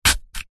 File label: food apple stab with knife; zapsplat